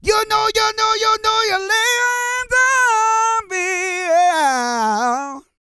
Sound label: SING; Spectrasonics; Vocal Planet Vol.1 Gospel; akai